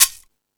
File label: Latin Layers, drum machine